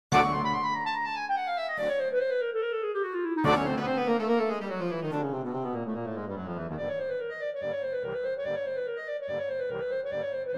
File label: Flight of The Bumble Bee Clarinet